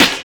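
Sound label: strange snare; Drums In Your FACE!; 90 s Sample CDs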